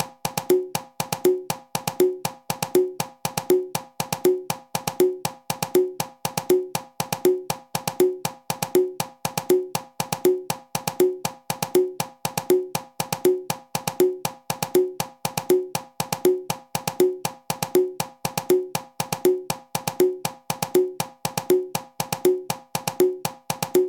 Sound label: palo cachimbo